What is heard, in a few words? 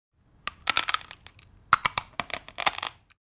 Eating crunchy snacks mouth open